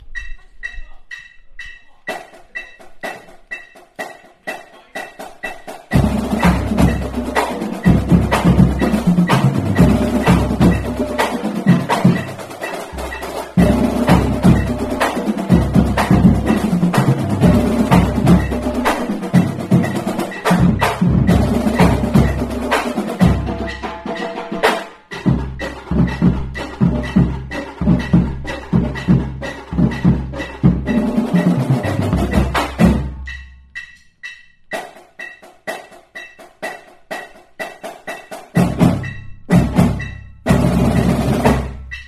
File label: Drill Team Tryout Music Kick; Mansfield HS